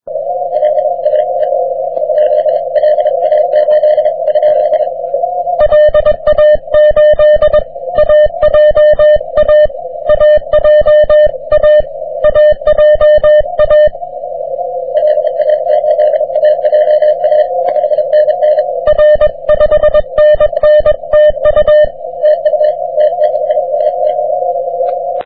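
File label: 30m continuous wave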